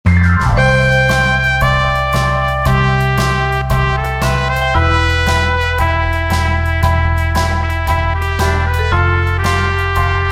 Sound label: rnb mix